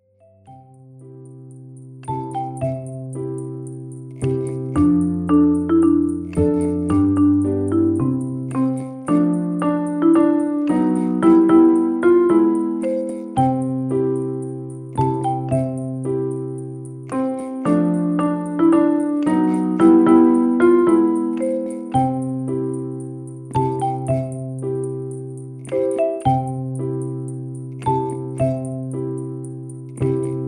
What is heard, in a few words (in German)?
mit Rhythmus; Sternentanz vereinfacht